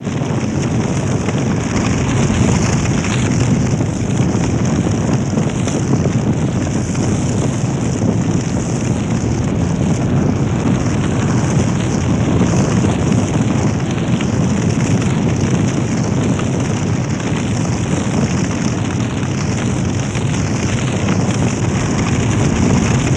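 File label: Flames Heavy Fire Loop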